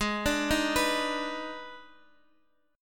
D sharp Major 7th Suspended 4th Sharp 5th, G sharp